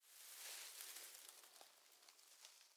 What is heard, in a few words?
Hay Movement; The Town